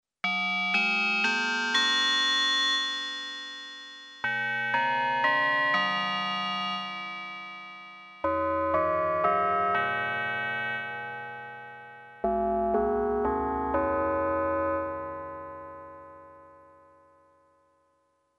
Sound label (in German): ringmodulator